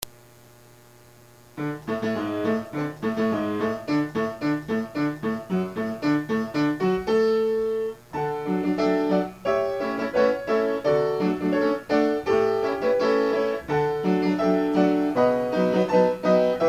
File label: marche militaire, piano